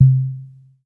BELL C2; Q Up Arts; Dream Experience

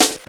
snare w foley

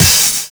PAISTE HI; Univers Sons

